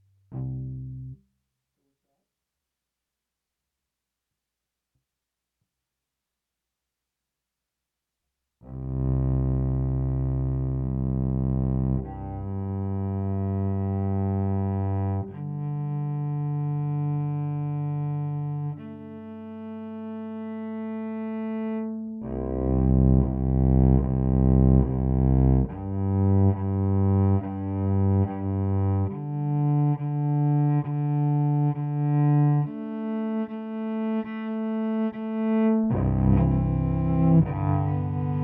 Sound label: pickup direct